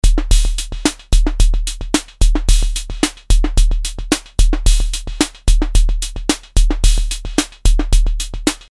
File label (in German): MFB 501 PRO Pattern mit FX